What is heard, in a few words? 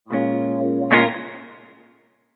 Funk Guitar Chords